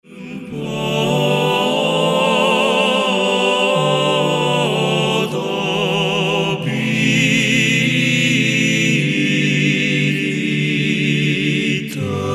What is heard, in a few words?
Voice Quartet; Camara Ta; florilegiu